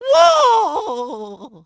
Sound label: burned to death; Mario; Super Mario Sunshine